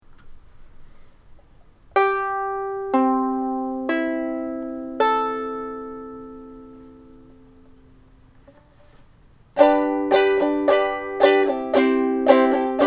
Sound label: Walnut pear soprano